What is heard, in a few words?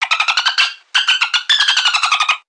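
Pets Insect Large Ecstatic; creatures; Lego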